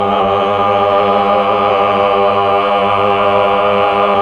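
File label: voice Mellotron choir; Roland LCDP09 Keys of the 60s and 70s 1; Melotron Vox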